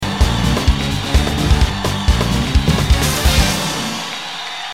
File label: battle intro; Paper Mario 2 The Thousand Year Door; glitz pit